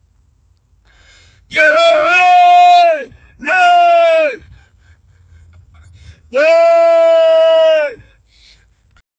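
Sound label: man shouting while running; sport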